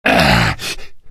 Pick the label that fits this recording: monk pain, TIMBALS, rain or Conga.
monk pain